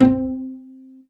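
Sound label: E-Mu Proteus FX Pizzicato Strings C4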